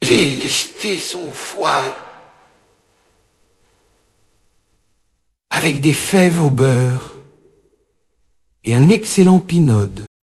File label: jingle